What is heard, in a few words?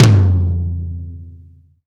TOM, Laidback; Sonic Foundry (Sony Creative Software); Loops & Samples Drum Tools